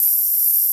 KOR HP F4; Analogon

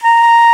SOPR. BAN, Bansuris; Winds; E-MU Producer Series Vol. 7 – Old World Instruments

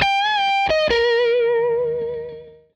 Tele Guitar Loop; Sonic Foundry (Sony Creative Software) - Six-String Orchestra

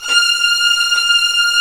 STR SW VLN, Vlns 8 fff; String Master Series

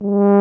tuba G; Miroslav Vitous; Solo Instruments VOL-2

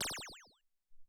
Sci Fi

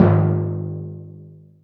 timp; Greytsounds; Scott Peers Sound Engineering

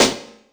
SP1200 snare, E-MU; E-MU Formula 4000 Series Vol. 1; Hip Hop Nation

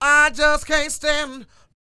SING W, Vocal Planet Blues & Soul, BLUESY; ILIO

